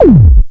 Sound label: Roland MC-202